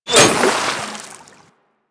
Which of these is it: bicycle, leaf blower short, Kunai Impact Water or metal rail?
Kunai Impact Water